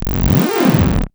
Bass Slur; Sonic Foundry (Sony Creative Software); Mac Money; Basses

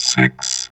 VOX VOX, Electro Vox

